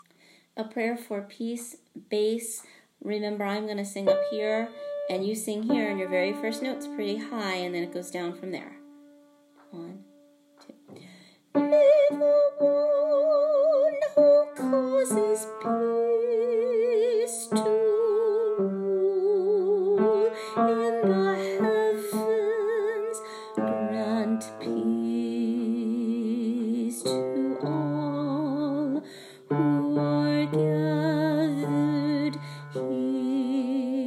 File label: Bass; High Holidays